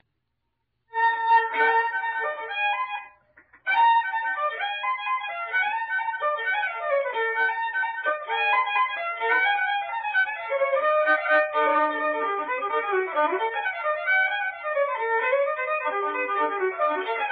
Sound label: Rickett's Hornpipe